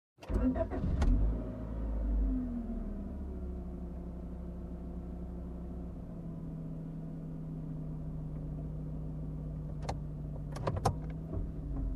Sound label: Car reversing fast from cockpit